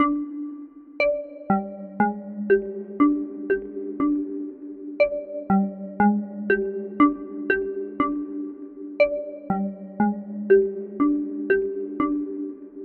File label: Bells Ringtone